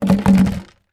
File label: leisure draughts checkers outdoors large plastic piece down drop